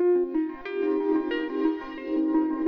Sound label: arpeggio